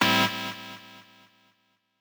stab

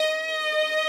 violin

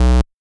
bass

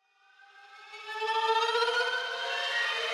horror string

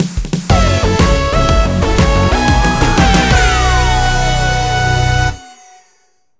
JINGLE GAMEOVER; maimai

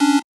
Chord

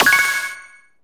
SE GAME EXTAP; maimai

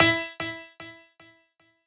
piano

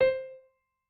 piano

